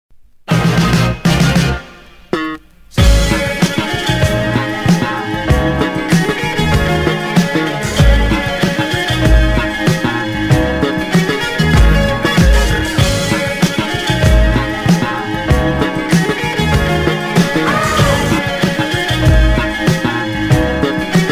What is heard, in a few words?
(Instrumental)